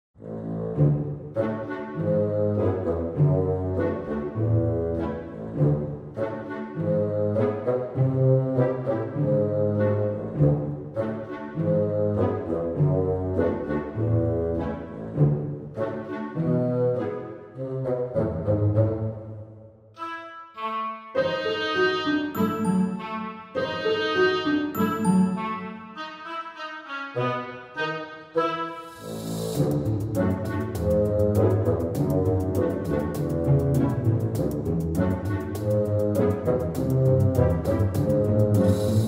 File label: Funny Sneaky Music; Background Music